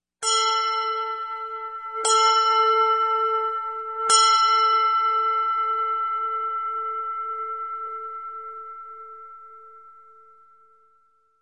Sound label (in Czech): stolni hodiny